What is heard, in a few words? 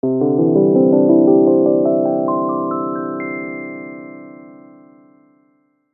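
Musical Electric Keys Rhythm; Keyboards; Blastwave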